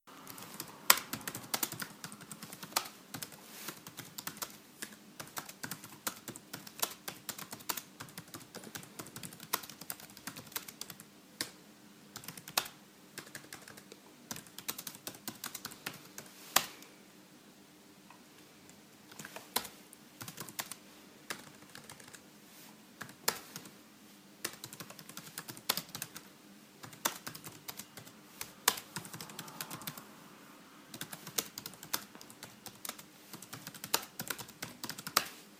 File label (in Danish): computer taster